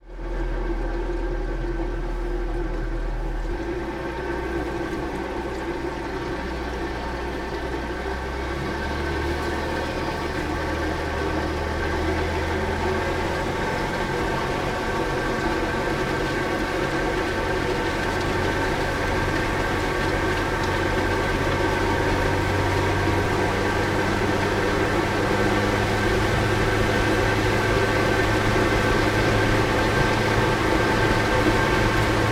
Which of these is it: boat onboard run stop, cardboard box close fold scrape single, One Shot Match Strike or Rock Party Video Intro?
boat onboard run stop